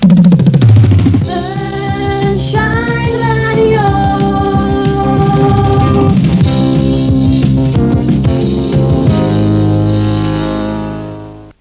sun Shine Jingle; SunshineJingles

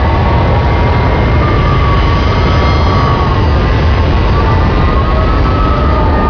pod steady lo loop

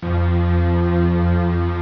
HUM

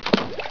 SPLASH, Water

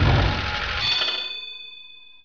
coin roll short

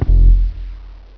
POING; Rosegarden

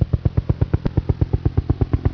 HELI, sound effect Flyby

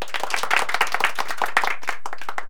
APPLAUSE SHORT; Rosegarden; Z-TV

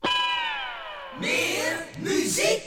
jinglepackage; topformat LP jinglepackage 1

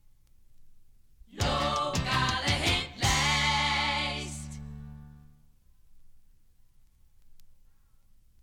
topformat maxi jingle single

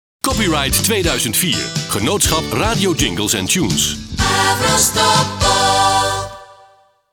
jingle; avro jingles; publieke omroep

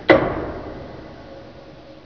POP

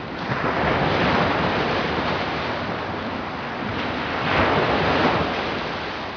surf loop

